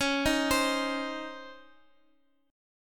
C sharp Major 7th Suspended 2nd C sharp, Guitar Open F; arpeggiated; chord; x,x,x,8,6,7